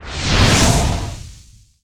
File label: Menu Scifi